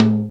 TOM L, Kawai R-100 Drums

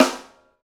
St Snare, Roland BK-5; Snares

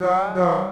Fx Vox; Equipped Music; Loungin House

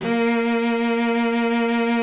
A#3, cello vib; Xsample VOL-7

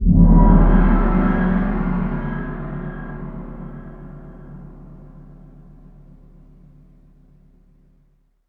GONG WEIRD; ILIO; Synclavier Percussion 2; World & Orchestral

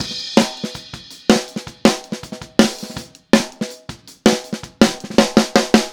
Fill Snare, Drums, 162 BPM; Drums from the Big Room The Mixes; Take Your Toms Off

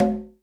Low Conga; Zoom RT-123

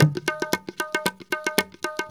India, Heart of Asia

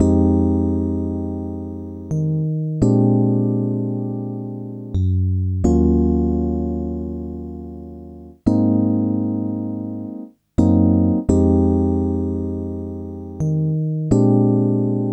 Rhodes

